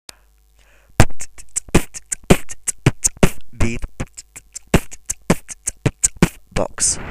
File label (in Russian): Beat, Супер простой бит; b - Classic Kick pf - Snare t - HiHat